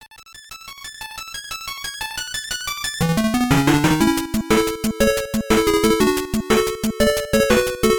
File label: title screen; tetris